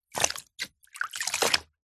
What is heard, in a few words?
cleaning cloth drop into bucket of water; household